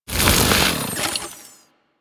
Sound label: Kusarigama Extinguish; Grn Kusarigama